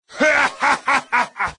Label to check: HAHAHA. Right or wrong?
Right